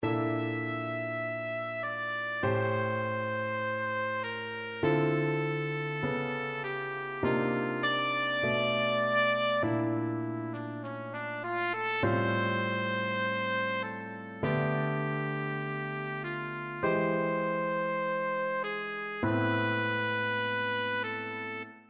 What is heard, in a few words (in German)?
Klavier